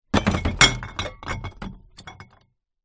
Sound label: household trashcan recycle glass bottle throw in on other rubbish